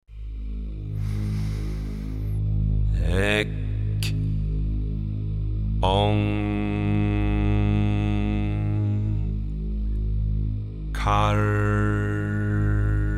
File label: Meditation